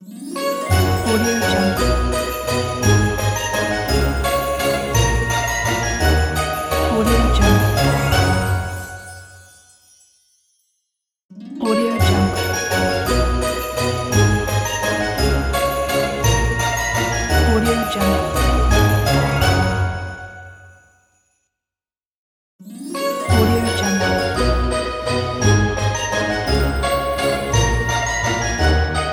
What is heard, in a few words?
we wish you a merry christmas ident